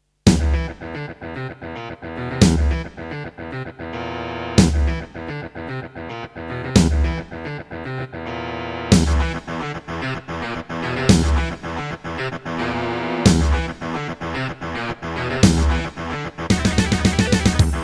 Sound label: Tascam Pocketstudio 5; Doom - E1M1